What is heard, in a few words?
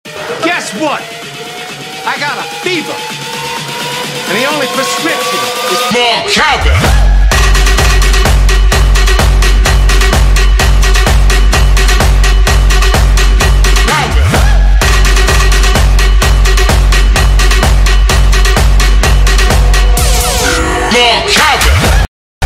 cowbell